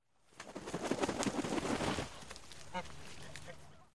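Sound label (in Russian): короткий пролет утки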